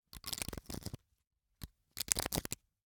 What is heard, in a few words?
Hwatu, card game, hard plastic, small, clatter, stack, drop, slide, double; Eiravaein Works; Vaeyan IV